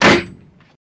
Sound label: Boat Door Close; boat doors